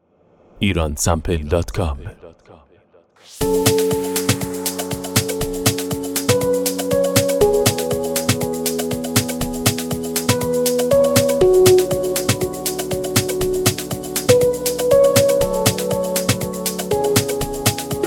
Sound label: afro house percussion